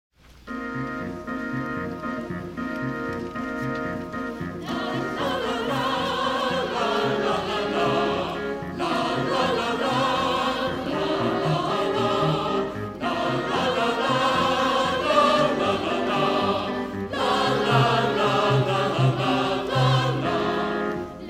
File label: Bar'chu (Deutsch); Choral